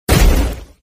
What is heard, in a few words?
skill bullet; meteor